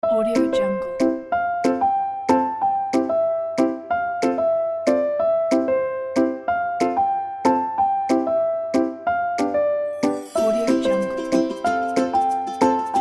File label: upbeat easy kids song